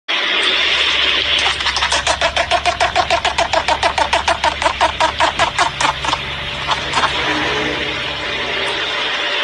pufferfish puffing up

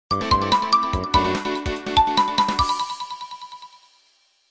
power off; movistar

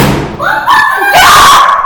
scream

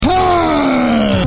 hrrrrrrgggggg

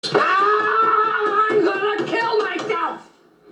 aaaaahhhh; michael scott

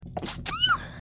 sneeze

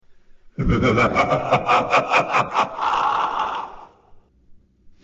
scary laugh

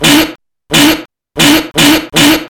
fart; hatsune miku

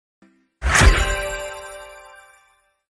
sparkle open curtains

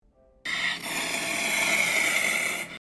hissing; floppa

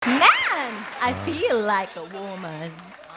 singing

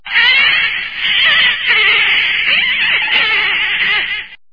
green slime sound

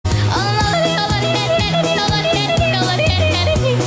yodeling voice

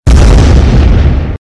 explosionn

